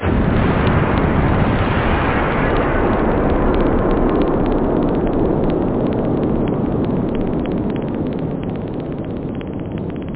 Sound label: bang; da capo vol1